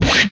Swipe; retro arcade

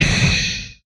Hiss

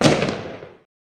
bang; bang sfx

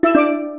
jingles STEEL; music jingles; kenney

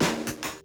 Garbage Snare; BB 2HTC Samples Vol 4; Drums

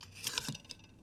Small Knife - Sheath; Micro Pack

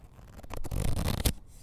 Flipping Pages; BB Retail Therapy Sample Pack

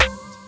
Goofy Clap, Drums; BB 2HTC Samples Vol 4

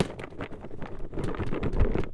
Rolling Bin of Plastic; BB Retail Therapy Sample Pack

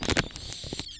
Crinkle Wheeze; BB Retail Therapy Sample Pack

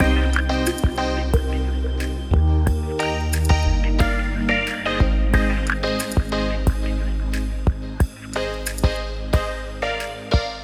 Uplifting Synths, Loops; BB 2HTC Samples Vol 4 Addendum

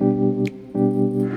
Chord; Nice Chord; Weird

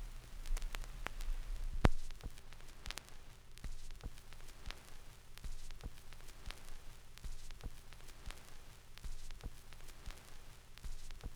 Ambient Noise, Record Fuzzies; Micro Pack

